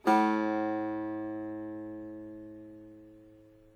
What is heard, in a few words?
soft, tanbur